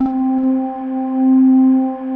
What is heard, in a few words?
SINE MELTS, pads; Pro-Rec - Synthsonic; TR PADS